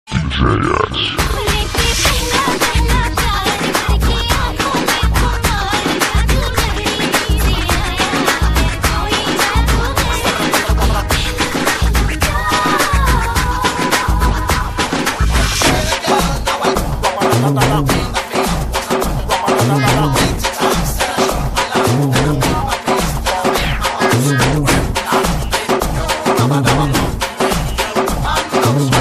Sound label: Hindi Kuthu Mix